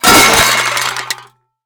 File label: Metal Crash; Crashes; 1001 Sound Effects